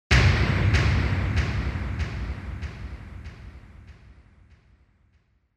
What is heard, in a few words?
Impact High Delay; Onoma